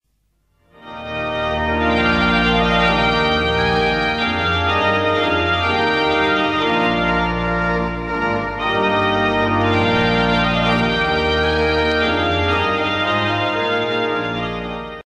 Orgel

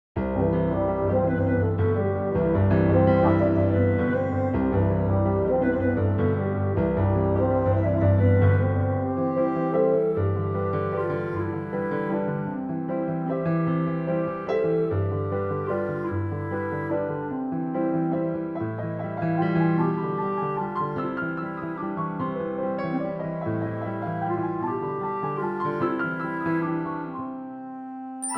Vlindermuziek afwisselend hoog en laag

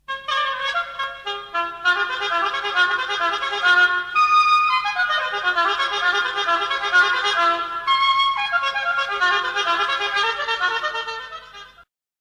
Houtblazers hobo alleen

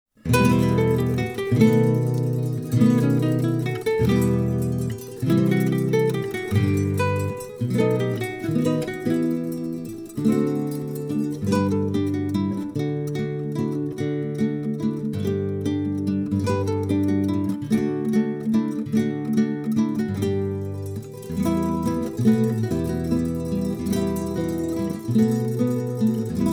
instrumentaal

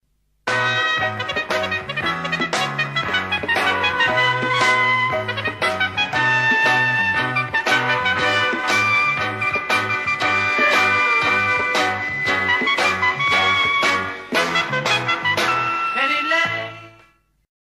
Trompet in pop

